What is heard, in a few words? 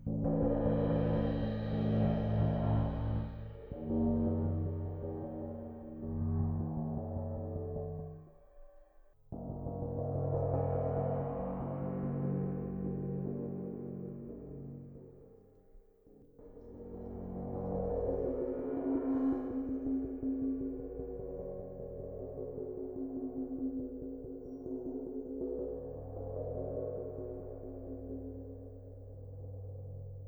cymbals mobile frequencies